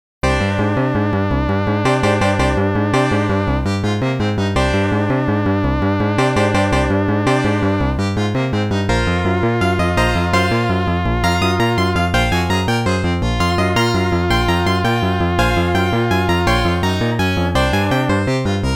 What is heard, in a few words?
Desert